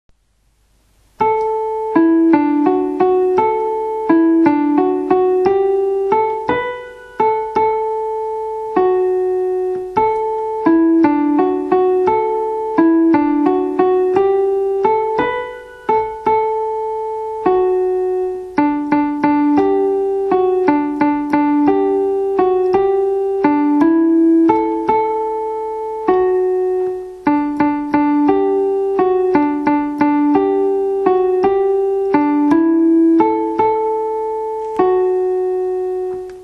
alto clavier viens chanter avec nous

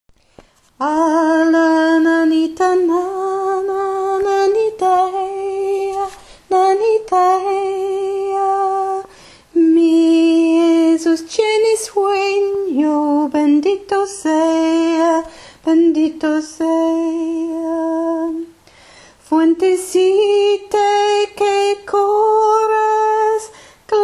voix alto; a la nanita

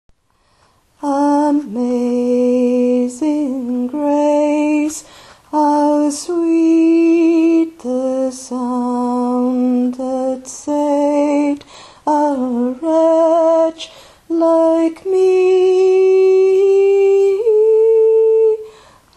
voix alto

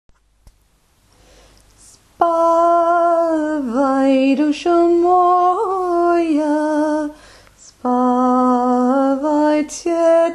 voix alto

